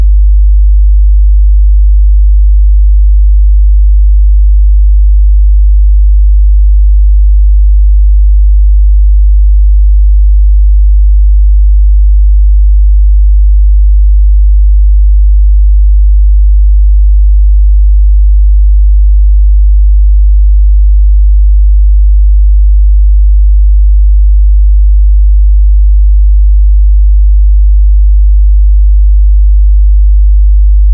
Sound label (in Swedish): 50 Hz sinus